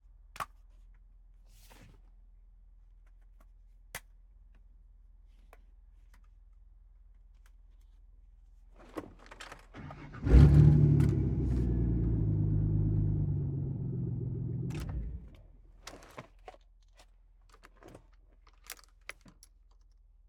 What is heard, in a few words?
Ford Explorer SUV 4x4 Start Engine, Idle, Rev, Off, Explosive, High RPM, Keys, Seatbelt; Ford Explorer 2004; SUV Dirt Track Racing; 344 Audio